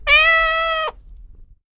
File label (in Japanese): nyaa